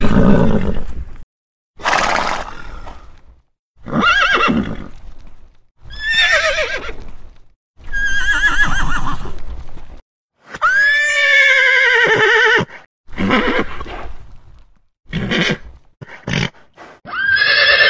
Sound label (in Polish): odglos kon